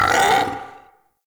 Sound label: Battle Dog Pain; Animals; Vocals